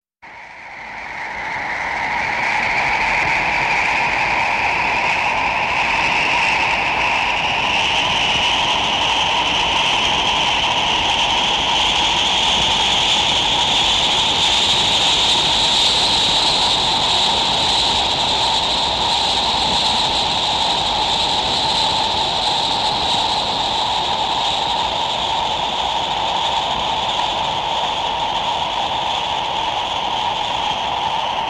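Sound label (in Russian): звук ветра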